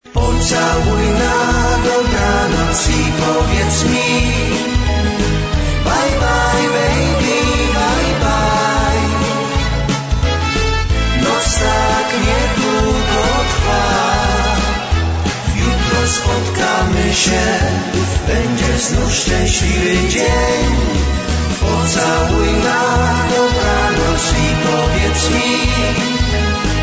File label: disco polo